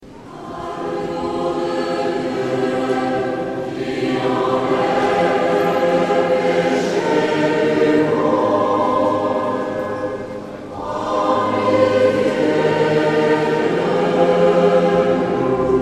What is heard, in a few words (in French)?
Tutti; Agnus Ermitage